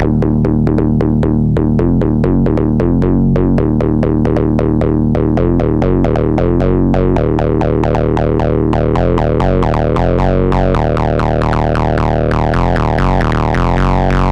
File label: Synth 134BPM D Analog Square